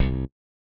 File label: Bass; Super Mario Bros. Remastered